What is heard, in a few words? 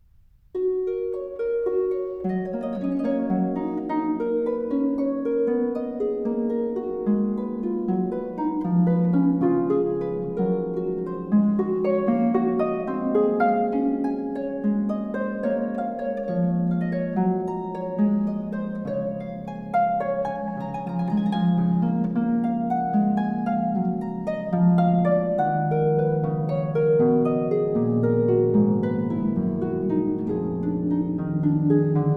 Prelude in F-Sharp Major; Bach; BWV 858; Lavinia Meijer